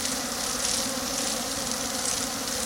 Butler Bee Swarm

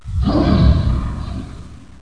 spawn bloater

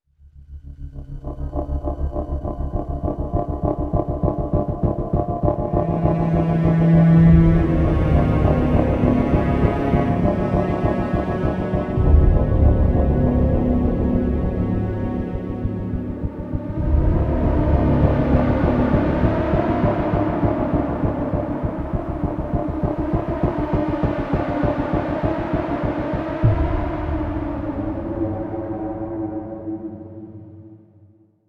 Suspense

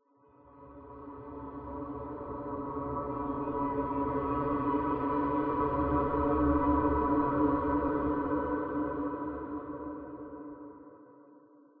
Granular Voice

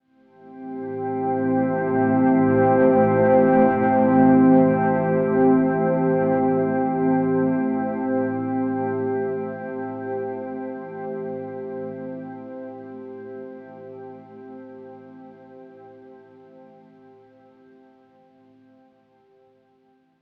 Chord Blur; inside

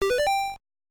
Paused; Clu Clu Land; animal crossing full gamerip

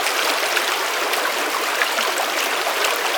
Water Stream; Pokemon Mystery Dungeon Rescue Team DX

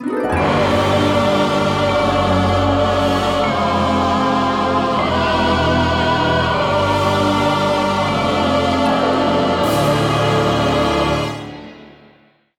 Course Intro Fanfare; crash bandicoot series sound box; Deep Sea Driving

